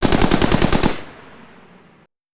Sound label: ita light armor mg attack; Hearts of Iron IV Death or Dishonor